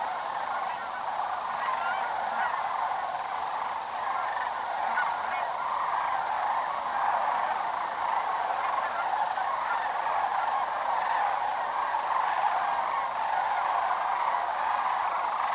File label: sandhill cranes